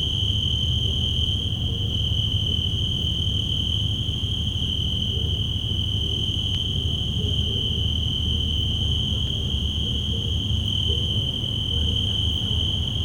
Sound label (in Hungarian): kabóca félék zakynthos, mezei kabóca; termeszetben